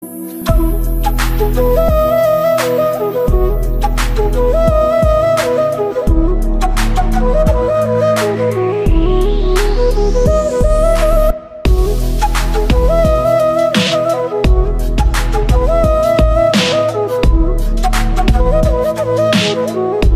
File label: krishna flute ringtone